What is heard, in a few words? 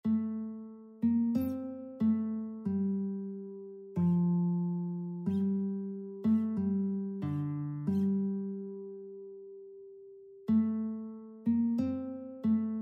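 bleak midwinter guitar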